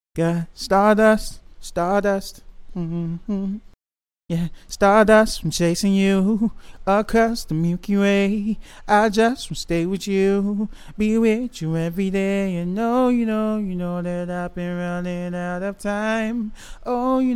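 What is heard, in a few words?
pop rnb 117, F major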